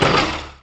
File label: suspens; RedLine demo